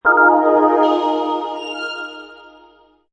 Sci-fi, computer panel or hologram display, movement